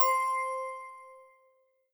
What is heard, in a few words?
bell